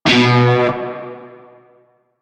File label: Shawzin Oct One B; Music; Warframe; Narmer Shawzin